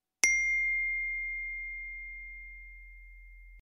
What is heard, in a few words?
Note Sound Iphone